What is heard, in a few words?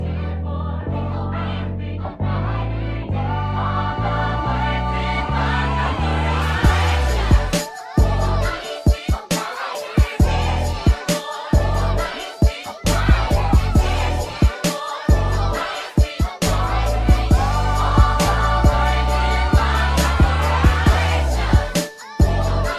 scream